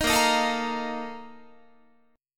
Bb Major 7th Suspended 4th, Guitar; BbM7sus4 strummed

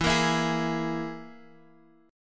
E Major Flat 5th E down Guitar Half Step Down

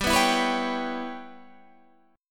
Db Minor 7th Flat 5th G, chord

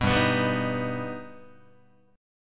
G Augmented, Guitar; G+ strummed; 2,3,2,5,3,2; 4A,4E,5A,5D,5G#,6C#

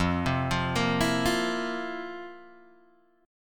F Major 7th Suspended 4th, Guitar, Open G

